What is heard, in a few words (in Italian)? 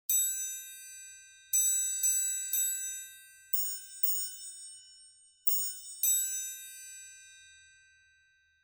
percussioni triangolo